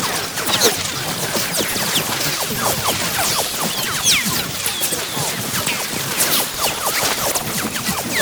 Hellfire Projectile Whizzby Loop; Enemies; Abilities; Warframe; Tau; Roathe

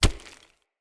Unarmed Punch Impact Rock; Weapon Impacts; Player; Environment

